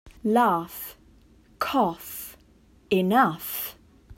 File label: Laugh